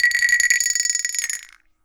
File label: Ring-A-Ding; Zero-G; Soundclash Nu Dance Electro; Acidized Wavs; FX Atmospheres